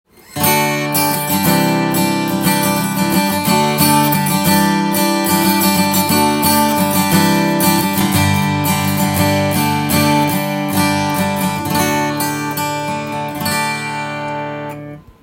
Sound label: yamaha ll36, guitar